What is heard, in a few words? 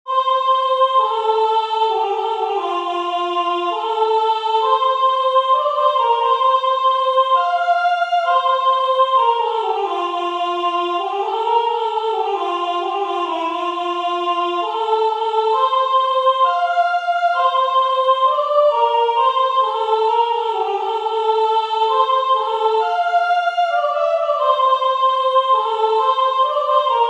Praise Vocal, Vocal Solo